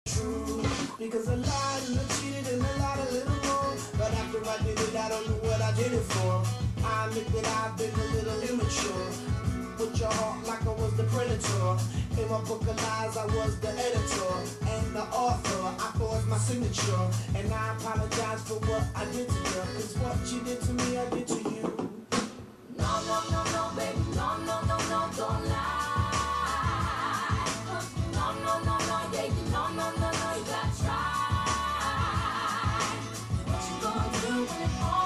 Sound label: song